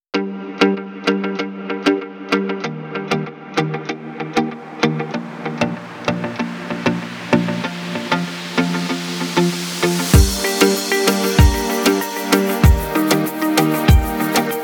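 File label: ispanyol latin reggaeton; By Burak Sound Bank; latin ispanyol tema vol 2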